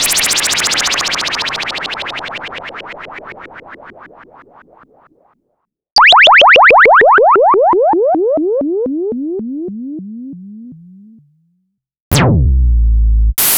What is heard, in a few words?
Moog Minimoog FX